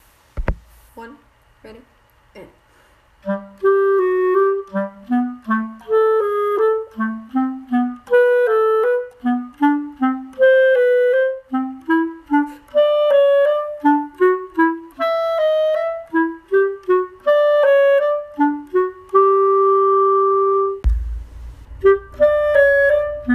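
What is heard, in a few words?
bassoon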